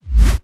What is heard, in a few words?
BD Gate FX, Bassdrums; Vengeance Samples